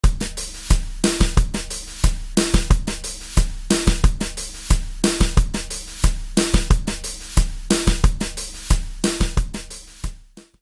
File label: snare with Brushes; Longo; BFD拡張音源; fxpansion; snare selects